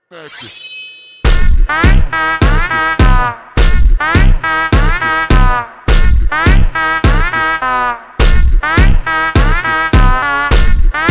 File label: automotivo slowed